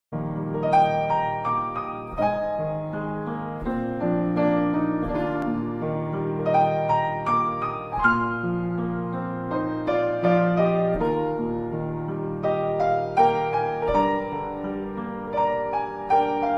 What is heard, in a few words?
ringtone piano; disney beauty and the beast